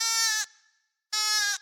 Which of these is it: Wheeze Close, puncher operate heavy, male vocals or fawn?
fawn